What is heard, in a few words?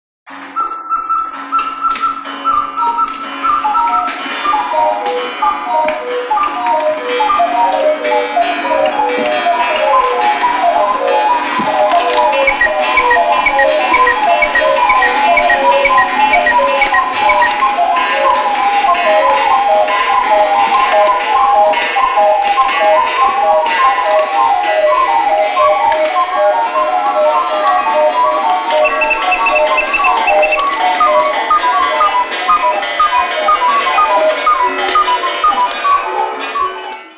Cuckoo Clocks En Mass; clock chimes rings